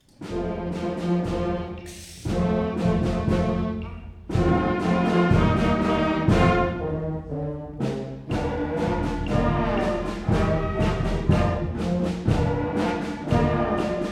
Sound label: 6th grade band concert; tfms band concert